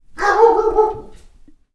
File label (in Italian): cane